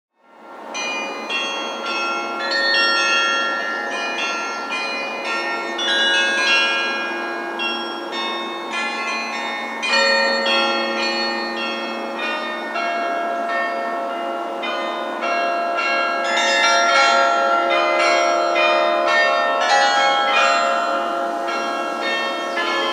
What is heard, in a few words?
Carillon; Range: 3.5 Octaves; Arabian Dance; Tchaikovsky’s beloved ballet, The Nutcracker; Publisher: GCNA